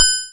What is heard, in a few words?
Korg N5 Rock Kit